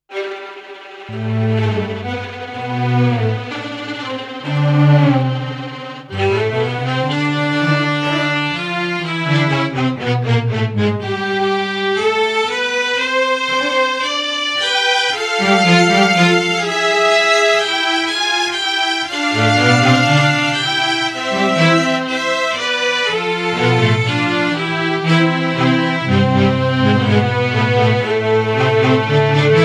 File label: Kamangat